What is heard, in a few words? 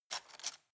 cutlery plastic drawer grab